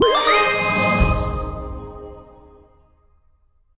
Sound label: Badge Unlock